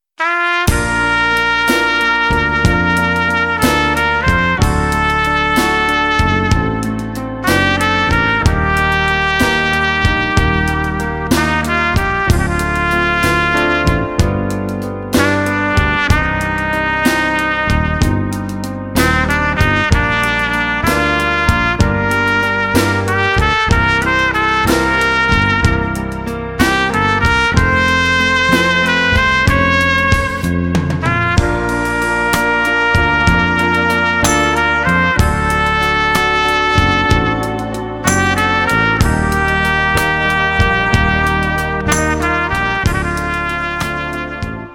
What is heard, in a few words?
trumpet in the sky